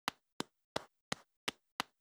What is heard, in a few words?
Hero Run Footsteps Stone; gamerip